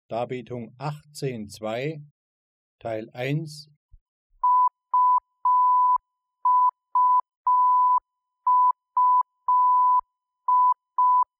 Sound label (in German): Rhythmus